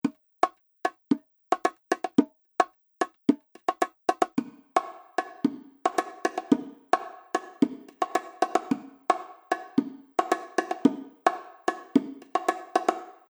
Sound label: Bongos